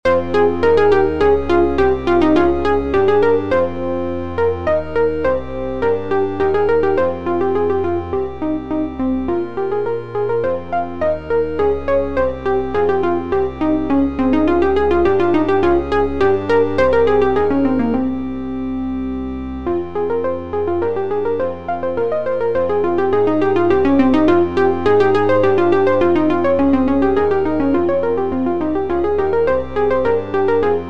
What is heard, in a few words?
short sci fi suite